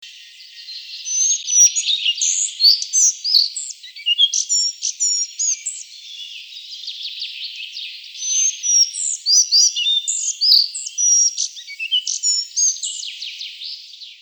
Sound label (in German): Singvoegel Halsbandschnaepper